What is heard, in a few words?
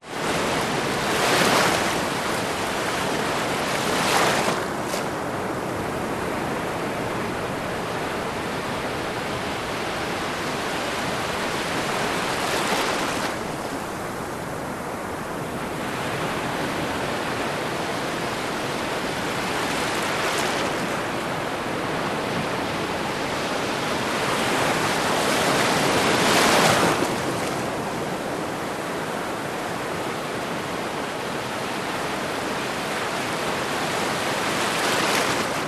nature ocean sub tropical distant surf smaller waves lap onto sandy beach